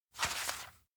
book hold face; anemoi

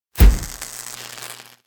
thrill shiver; anemoi

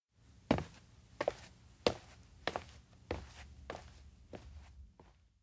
steps indoor hall walk away; multiverse; anemoi パッケージ版 1.1

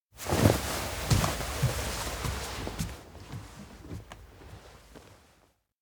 shoulder roll leave; anemoi

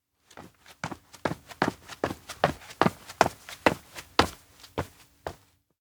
steps outdoor hilltop run up; ashiba; anemoi

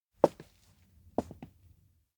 steps indoor step close; awayuki; anemoi パッケージ版 1.1

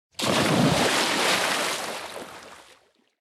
dive splash; anemoi

